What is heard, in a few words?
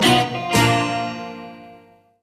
cadence